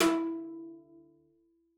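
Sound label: E4, cello spiccato; Xsample VOL-5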